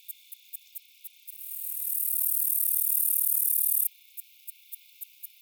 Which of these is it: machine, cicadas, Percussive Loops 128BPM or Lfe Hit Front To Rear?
cicadas